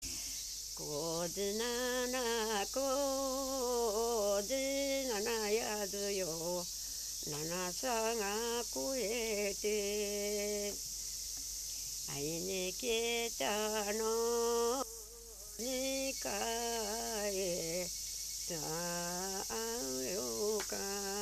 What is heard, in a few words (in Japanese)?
mugitukiuta, minyou